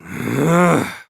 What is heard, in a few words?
grrr